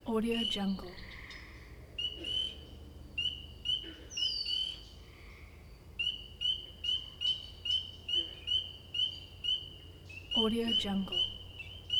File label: swamp in the forest